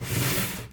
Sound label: slide in